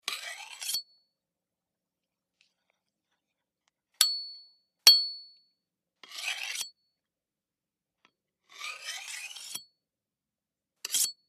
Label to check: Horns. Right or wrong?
Wrong